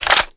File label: dart mag out, weapons